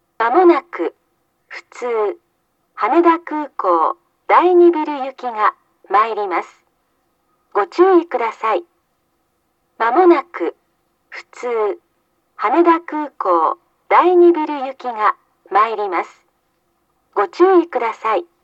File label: oi-keibajo-mae local haneda airport terminal 2, tokyo monorail station